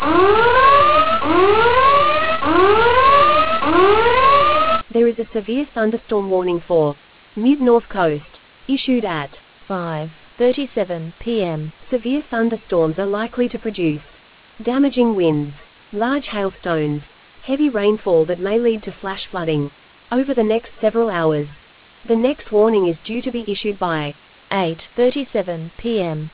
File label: storm audio